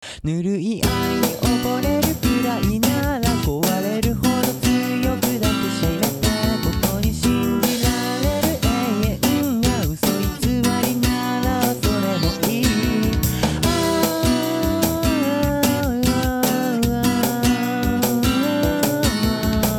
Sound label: analog